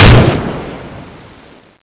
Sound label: fire; cso; x-90